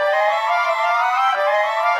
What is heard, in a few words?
Winds, Orchestral; Sonic Foundry (Sony Creative Software); Orchestral VOL-2; Modern 16